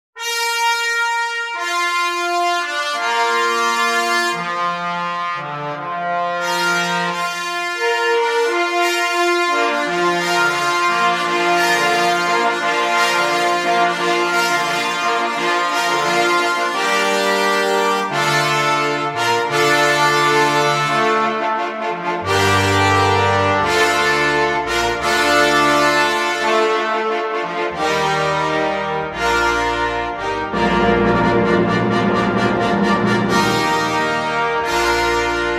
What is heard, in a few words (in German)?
Fanfare; LIBUSSA; B Smetana